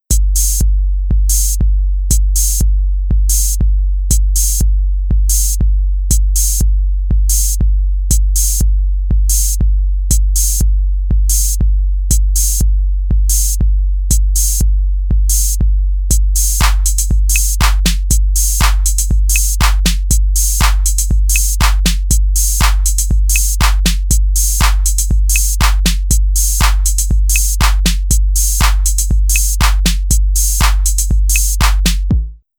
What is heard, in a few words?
dance drum collection; GIGALOOPS